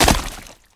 mud hard land; Original TK Sounds

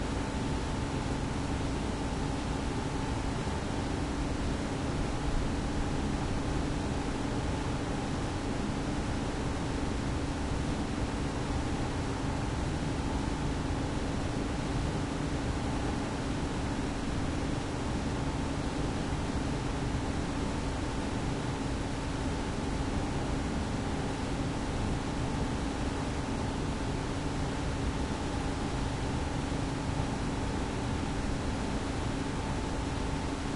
warehouse; Golden Crayon Sounds

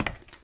ceramic step; Golden Crayon Sounds

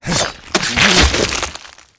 Item Chooser Close, Horror; ESAN VO Sound Schemes; ESAN Horror pack

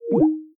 Call Ended; Skype Sounds

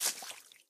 mud step; Golden Crayon Sounds

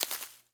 weed step; Golden Crayon Sounds

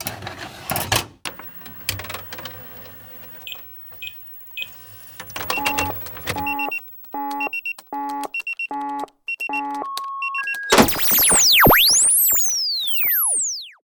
locker hack start; Original TK Sounds

